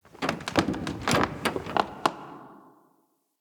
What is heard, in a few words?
Station Misc, Ambience; Soul Plane; Orlick